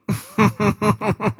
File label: laughter short